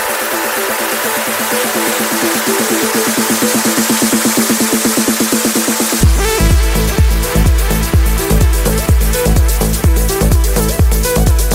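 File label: trance bass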